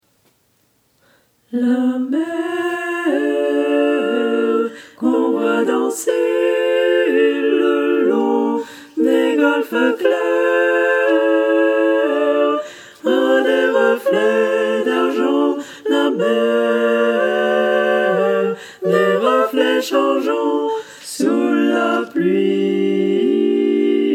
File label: tutti